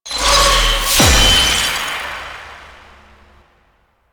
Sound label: Empowered Geode Cast; Warframe; Citrine